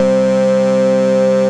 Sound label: Bass, Synth Lead; Wake For It; Ableton; Creative Extensions